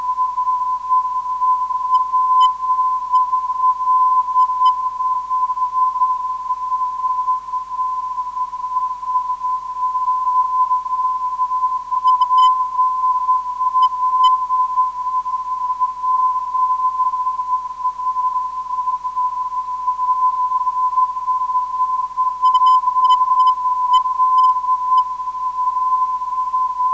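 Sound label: 351khz, non-directional beacon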